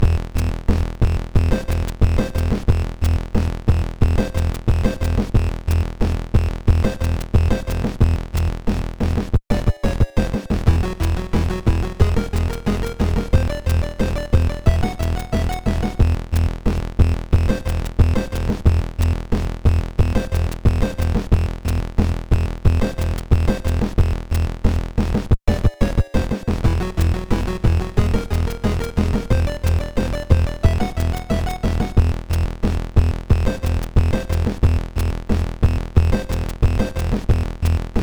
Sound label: Lava Area; Esper Dream 2; nes